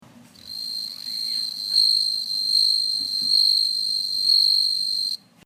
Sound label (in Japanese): suzumushi